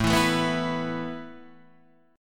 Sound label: A Suspended 2nd A; Asus2 Chord {5 7 7 4 5 7} strummed